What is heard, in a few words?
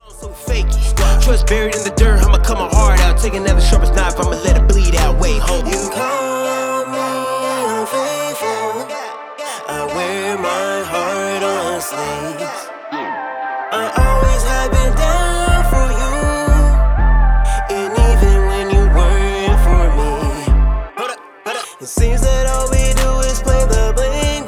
Scream